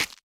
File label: Weapon Bone Stinger